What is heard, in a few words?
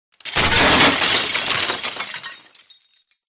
glass break